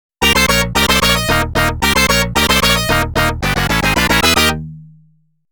RACE START; Taito Digital Sound Archives Arcade Vol.2

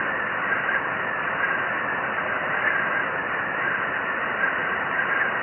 Windl; Buggy; PS1

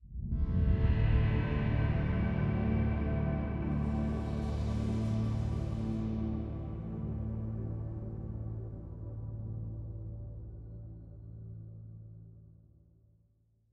End 190bpm

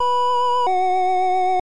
Logo; Sega Logo; Sonic Remixes on PICO-8

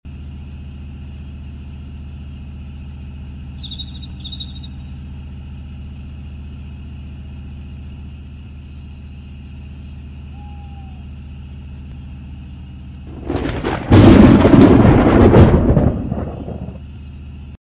Background Sound Of Night

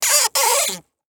squeak multiple rubber plastic rub

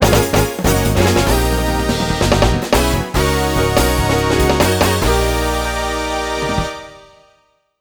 Goal; Super Monkey Ball 2; Monkey Soccer

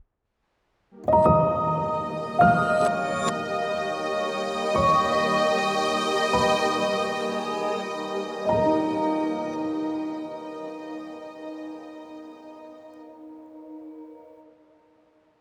74BPM; AngelGameEnd

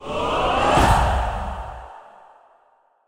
Ui Picking Epic Weapon; Metal Unit

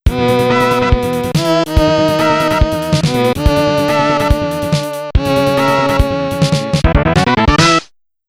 Lose; Super Rom Cassette Disc in Taito Vol. 2

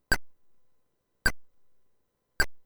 Tandy Clunk, clunk, clunk; Lemmings

